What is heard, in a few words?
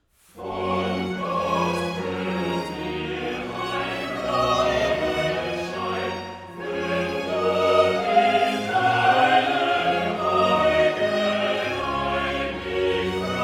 Choral; Cantata, BWV 172 'Erschallet, ihr Lieder, erklinget, ihr Saiten!'; Bach; Long Melford; Whit Sun